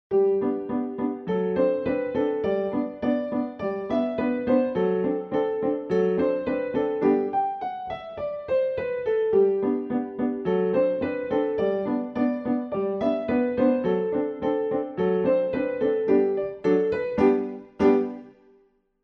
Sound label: can-can; offenbach